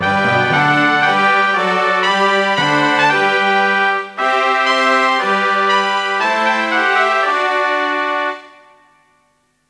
New Zealand, Fanfare; 1997 MMX LAN PACK (win 95); Worms Armageddon

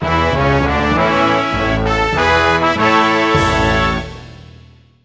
Finland, Fanfare; 1997 MMX LAN PACK (win 95); Worms Armageddon

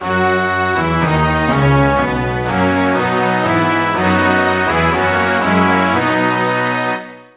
Norway, Fanfare; 1997 MMX LAN PACK (win 95); Worms Armageddon

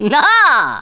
jump, Speech, Thespian; Worms Armageddon

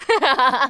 LAUGH, Smooth Babe

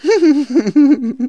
laugh; Worms Armageddon

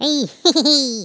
LAUGH; Worms Armageddon; Tykes

